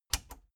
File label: plastic button latch scratch enable fast